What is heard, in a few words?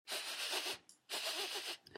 sniff